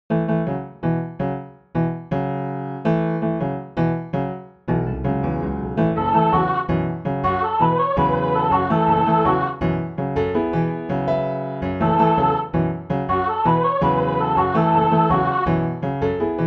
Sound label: w vox